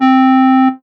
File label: Kawai K3 Clarinet C4